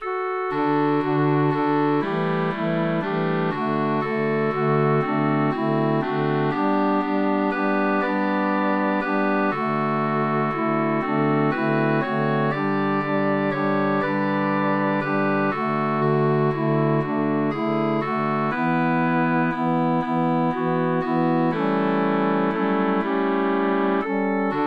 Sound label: Melody Harmony